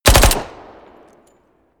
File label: smg uzi 45 heavy burst